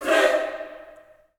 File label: choir